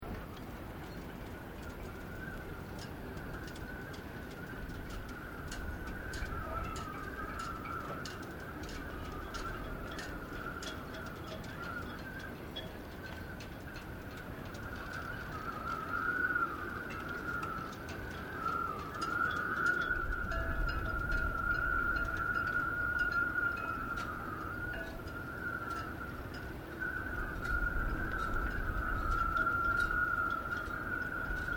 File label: Boat Yatchs Masts In Wind; Boats (Sound Effects:Vehicles)